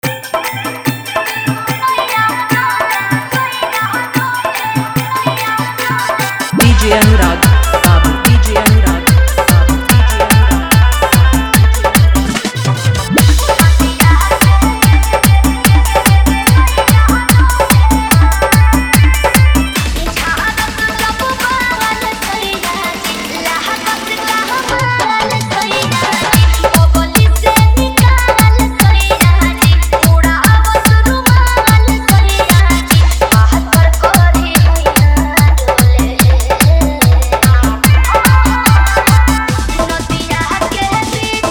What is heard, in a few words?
Dance Mix